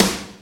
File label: Snares & Claps; Vengeance Samples